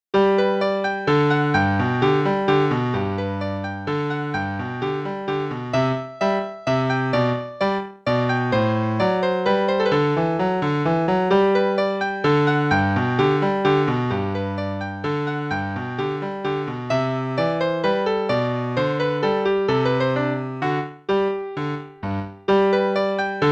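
Play-a-long, Minuet in G (right hand starts with arpeggio), quarter note=130, piano; Bach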